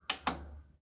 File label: VHS turnoff